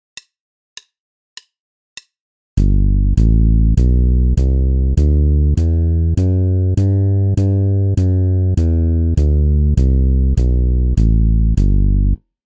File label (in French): Gamme majeure de Sol, basse; exercices